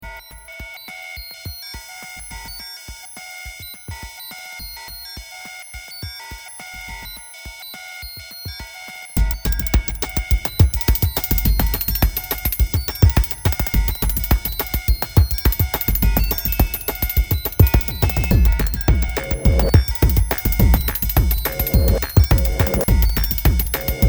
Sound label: Technotik